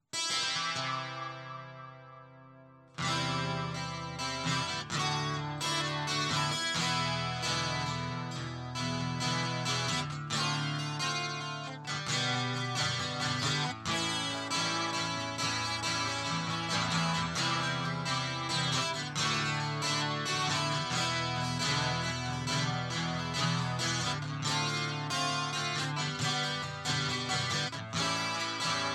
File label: Feedback Loop Guitar Loop